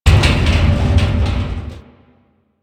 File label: Metal Barrel Slide